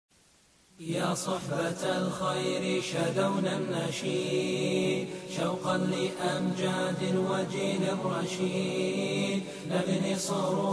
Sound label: Vocals Only, nasheeds